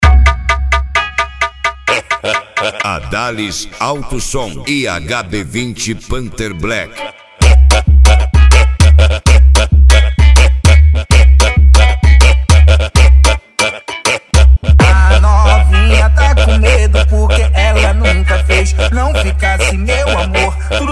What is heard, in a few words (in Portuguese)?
esp de cavalgada